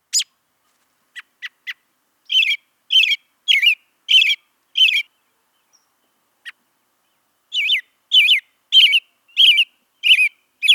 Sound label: American Robin Song and calls